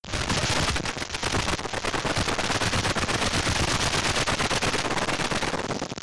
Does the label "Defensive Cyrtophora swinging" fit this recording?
Yes